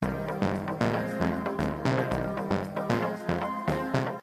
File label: ring dissonance; minecraft